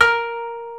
KOTO A#3

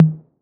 TOMS 808

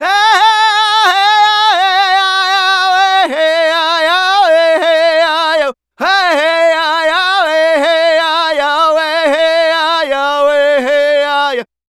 Native Vocals, Native American; Continental Drift Aftershocks

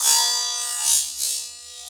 SFX, Drum & Bass, 160 BPM; Ueberschall; Drum & Bass Flash Trackz

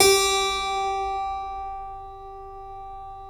G 2 CLAV; Keyboards Acoustic; AKAI; USB Soundscan vol.17

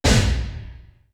DORSLAM; East West; Global Sounds; D4 FXS

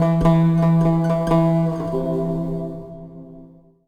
SIERRA GUI

